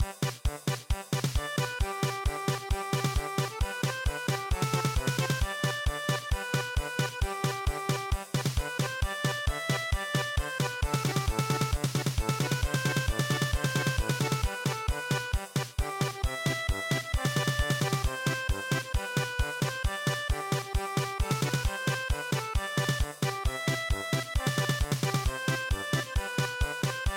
March, German Polka

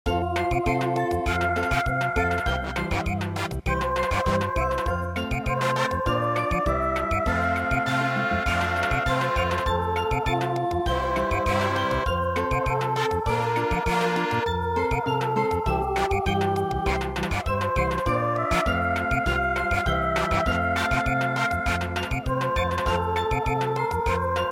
Samba, midi; Hark hark the lark

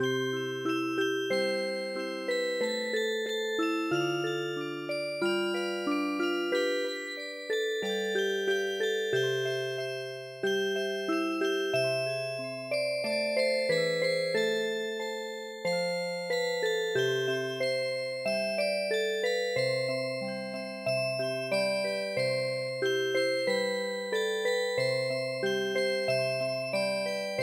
Music Box

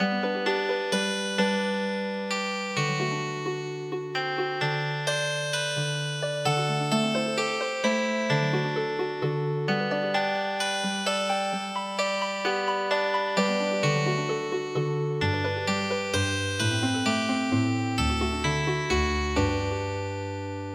Healing Harp

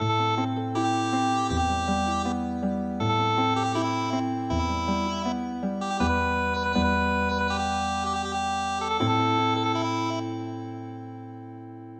Drawing Flower Healing Harp

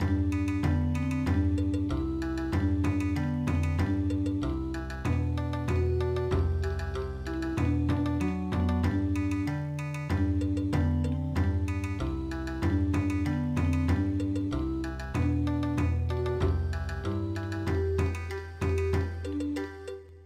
Bon Odori

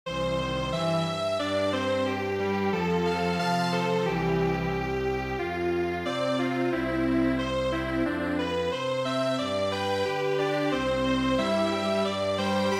GAVOTTE Strings Quartet

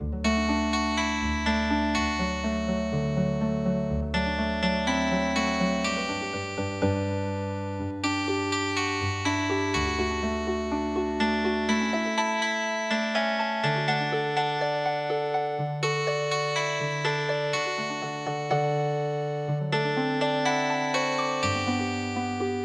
Healing Harp

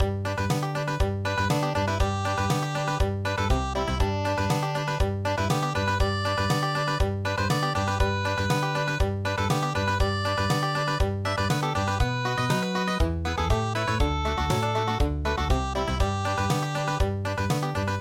trail to mexico country

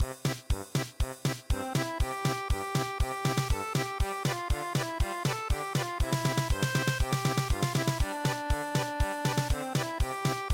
German Polka

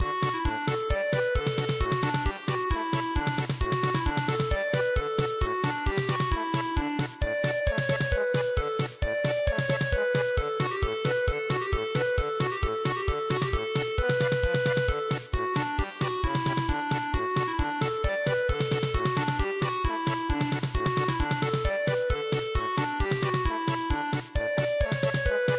German Polka; Elephant trunk

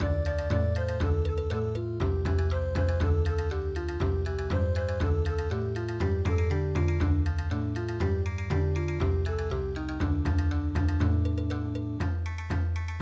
miyasan bon

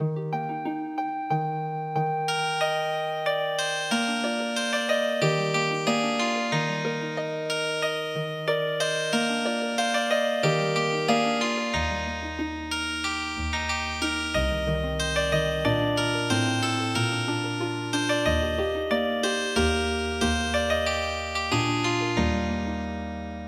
Healing Harp, traditional; The Blue Bells of Scotland

